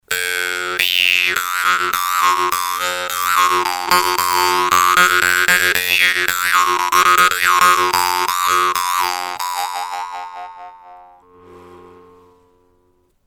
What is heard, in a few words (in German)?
vargan; sail